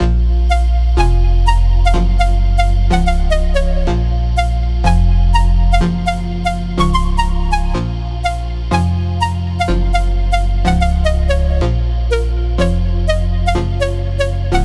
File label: 124 BPM A# Min, House, Breakdown Loops; Reasons; Cymatics